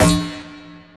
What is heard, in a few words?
SYNTH SD, DANCE KIT